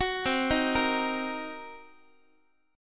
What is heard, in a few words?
C Minor 7th Flat 5th Guitar, chord; Cm7b5 arpeggiated; JGuitar